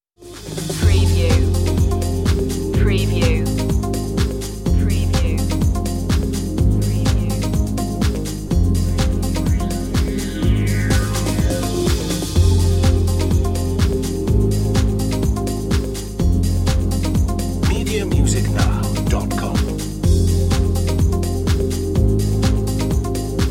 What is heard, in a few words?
trip minimal